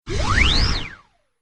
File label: Whistle Whoosh